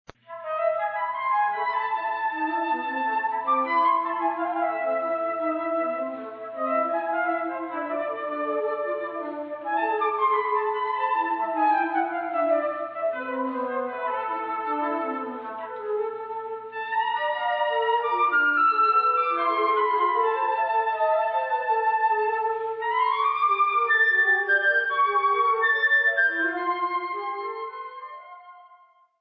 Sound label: 2 Flutes